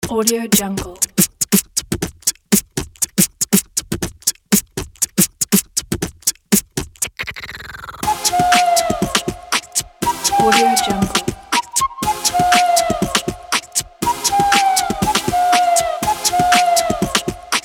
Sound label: beatbox